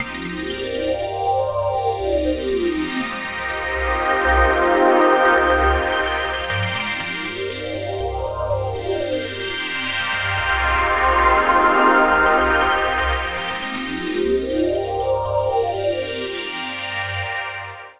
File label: Sweeper Modulation Effect Phaser Envelope Looped; Reason Studios Reason 11